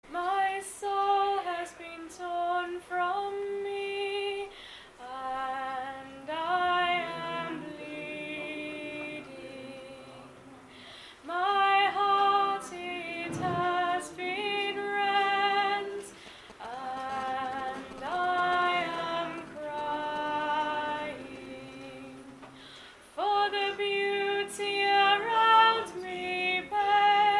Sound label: sung by me with lovely harmony; in the choruses